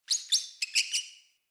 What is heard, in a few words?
Messenger Rat Squeak B; Ambient; Vocals; Verminia; Animals